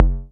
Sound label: Multi-Low-Perc